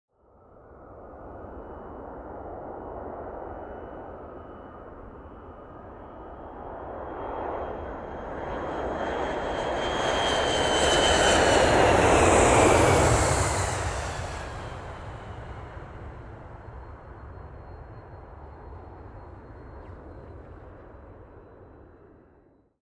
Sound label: Commercial Aircraft Flying by whirring sound